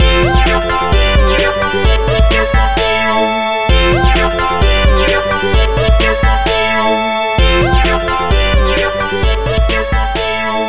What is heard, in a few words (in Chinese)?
通关 8bit 风格; Button Button Up; Windows